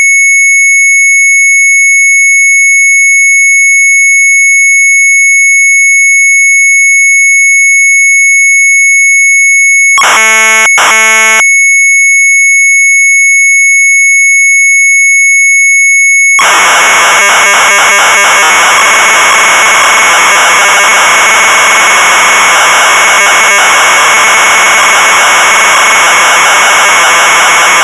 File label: MZ800 Tape, sharp; retromelsarchive